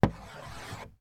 office chalk stick single stroke on chalk board; sound effects one

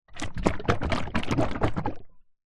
industrial engine oil large tub shake; zapsplat

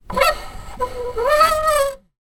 wood squeak turn; foley; zapsplat

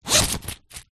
foley money pouch fabric coins zipper

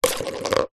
sport golf crazy ball drop into hole spin fast

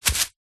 foley heavy rock drop on ground

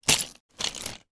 toy pushchair collapse; sound effects one

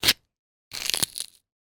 horror gore knife stab quick twist body crunch; zapsplat

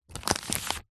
money 20 dollar polymer note run through hands

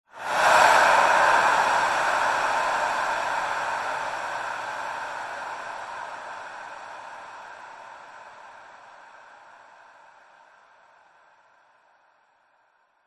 horror breath sinister evil large reverb; zapsplat

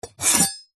warfare knife metal blade edge scrape wood

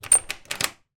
household key mortice in door turn

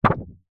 foley paper laminated sheet single short shake

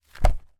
foley notepad several pages flip over together

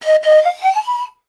pan pipe blow; musical

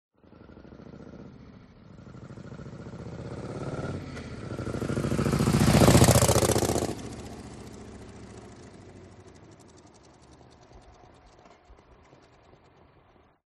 MOTORCYCLE Harley Drive By Medium Speed; TRANSPORTATION

